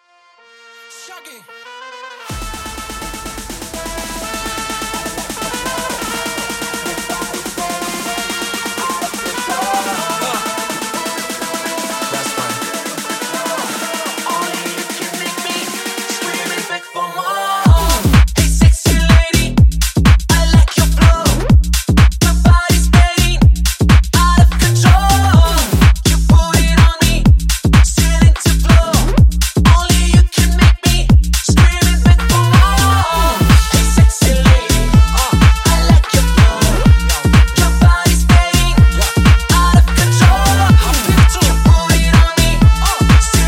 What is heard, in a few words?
Tech House Intro; Remixfreakz